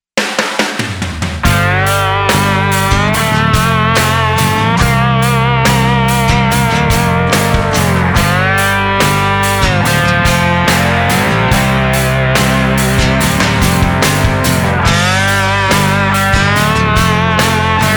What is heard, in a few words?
SLIDE; FLAN FALCON